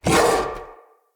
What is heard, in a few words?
Battle Dog Attack Bark; Animals; Vocals; Snc Battle Dog